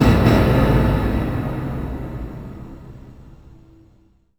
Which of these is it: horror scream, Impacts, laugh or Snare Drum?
Impacts